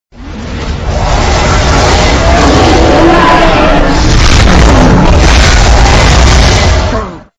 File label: balrog scream; torchlight